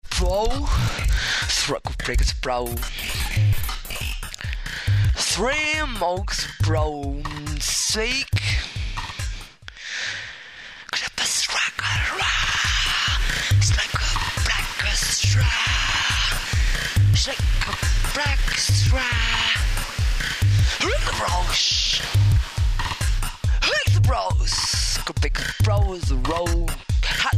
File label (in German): Sprechgesang